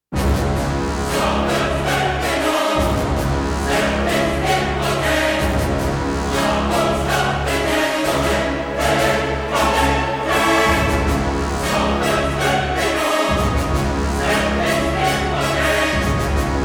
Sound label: Orchestra And Choir Only, Epic Choral Action; трейлерная музыка